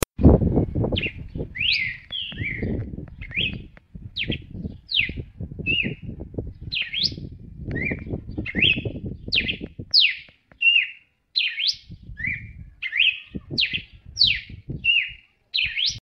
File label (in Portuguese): galo de campina